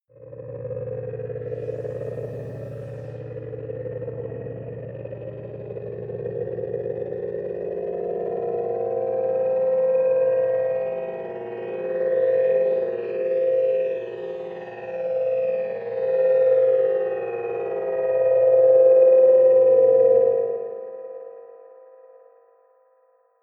dark temple, horror

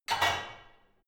barbell only replace hard; sport freizeit; fitnessstudio

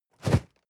cardboard box medium shake single hard short; objekte

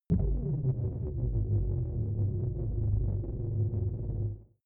space world blaster; laser

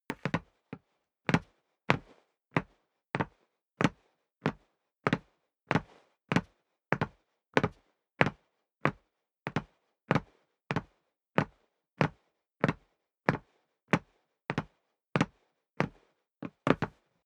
step boots wood parquet downstairs sequence; schritte

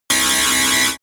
tile cutter synthetic

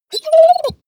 dog whine trill; natur-tiere; hunde

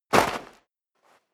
step sneaker gravel light jump

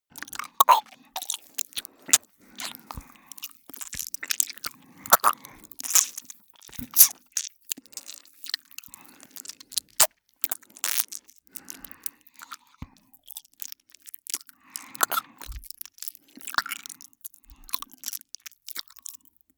smack lips; soundpool

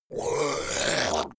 zombie grunt choke male wet; kreaturen

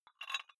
dumbbell take off very small weight gentle; sport freizeit; fitnessstudio

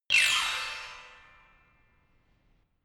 notification glockenspiel horror slide down hard

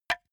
wood board clap hard; objekte; holz

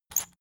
salt mill squeak hard

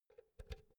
power cord insert soft; objekte; elektronik

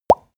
pop bubbles; soundpool

